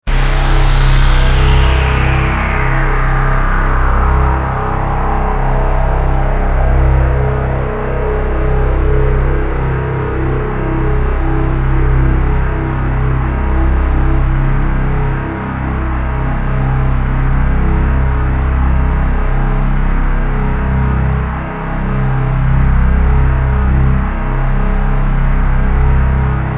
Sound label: Drone Synth Stab, Drone, Synth, Stab, Long, Horror